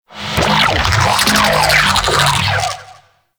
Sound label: Sentient Vocal Attack; Warframe; Sentients; Dolicholyst